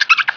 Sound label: Rat Attack; Playable Tutorial Level; TR2